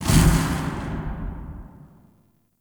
Soft Flame Burst; Ambience; One Offs; Champion Crypt

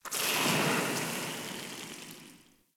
Pipe Sizzle Amb; Ambience; Warframe; Grineer Galleon

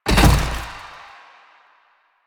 Collision Fast, Motorcycle

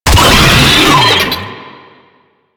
Brachiolyst Player Spotted, Vocals; Enemies; Warframe; Proto Infested

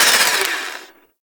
Turret Wind Down; Ambience; Warframe; Grineer Fortress

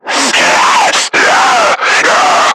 Screamer Idle; Warframe; Lasrian

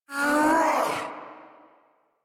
Attack Roar, Boss; Sargas Ruk

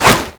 Whoosh B; Warframe; Weapons; Infested; Coda Hirudo

